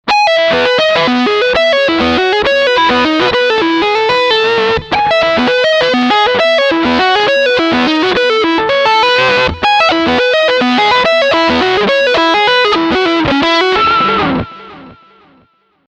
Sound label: Leadline Delay